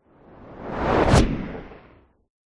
transition whoosh fast; sound design; zapsplat

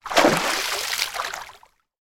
water splash or movement

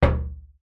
impact metal knock hollow

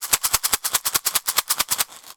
household pins glass headed container shake; sound effects one

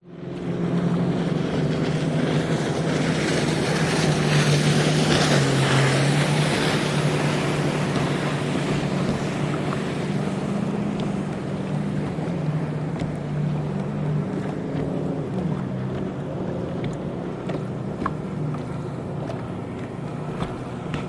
jetskis passing lake recorded from kayak; zapsplat

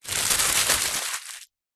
household baking grease proof paper scrunch up

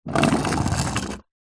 toy train wooden roll wood floor short

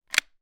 weapon gun ruger 10.22; still north media

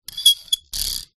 metal small clip slide squeak; foley

